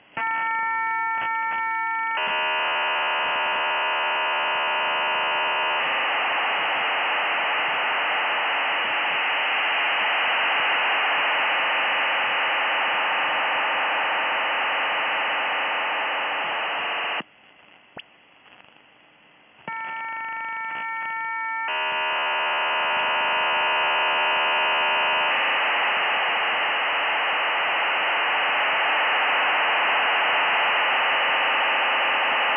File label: Israeli Navy hybrid PSK waveform, 2400 Bd 8PSK; PROPRIETARY WAVEFORM